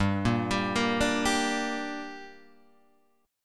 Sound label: G Minor G down Guitar Open G, chord